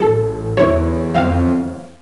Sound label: Victorian Lose; Mystery Tales: Time Travel; NDS